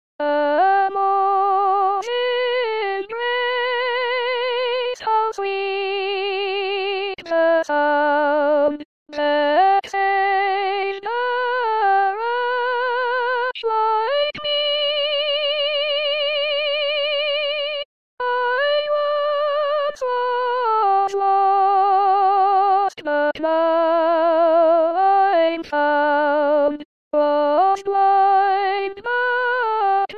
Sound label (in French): soprane; amazing grace